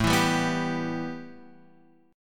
A 7th Suspended 2nd

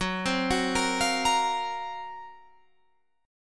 Gb Major 7th Flat 5th Guitar Drop D

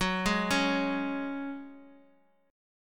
F sharp Suspended 2nd; arpeggiated; JGuitar

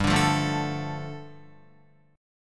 G 7th Suspended 2nd, Guitar; strummed; JGuitar

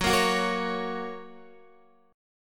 Gb Major Gb down Guitar; Gb strummed; JGuitar

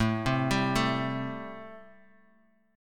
A-Minor 7th A Guitar; arpeggiated; Am7

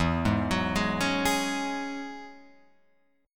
E 6th Add 9th E, Guitar Open F; arpeggiated; chord; 4,3,6,3,4,3

